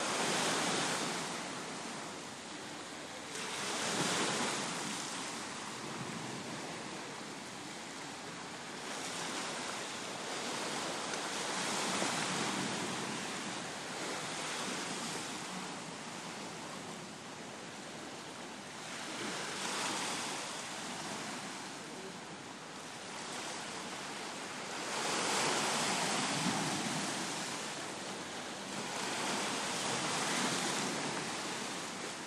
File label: libreville sea sounds